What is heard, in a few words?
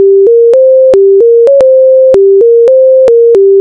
Faster melody